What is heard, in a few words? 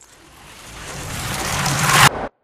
Dish Slide Player; Beacon; Titanfall 2